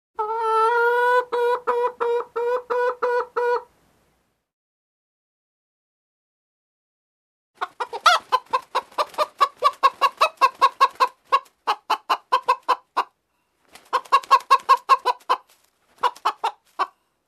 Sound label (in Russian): Птицы